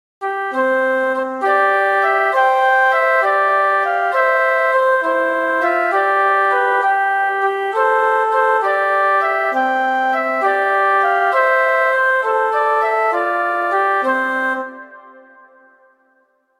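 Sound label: I Saw Three Ships, Christmas flute quartet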